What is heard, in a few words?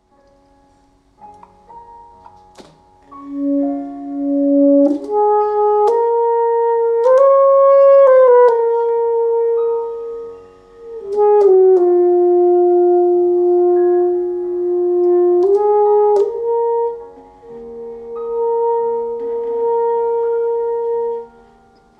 sax